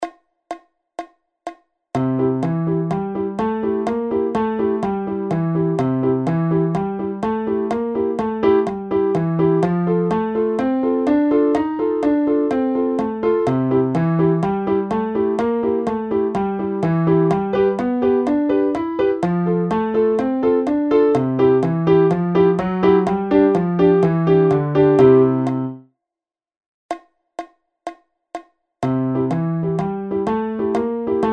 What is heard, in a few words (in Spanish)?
Blues rock con y sin swing